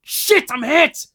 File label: hurt light; Escape from Tarkov